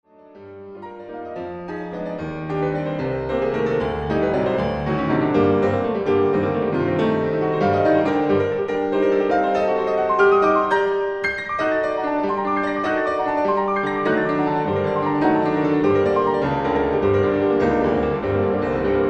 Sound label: Piano Solo; g Moll Ballade 1